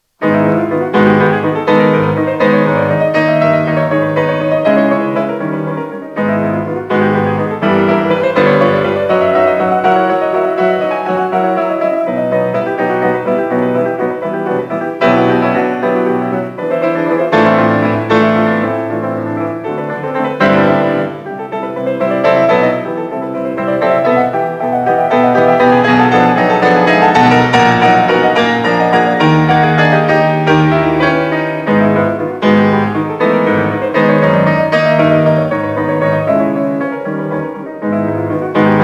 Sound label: Preludes; Chopin; Op28; Lympany